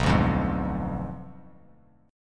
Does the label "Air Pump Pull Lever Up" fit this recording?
No